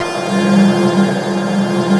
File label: ATMO SYNTH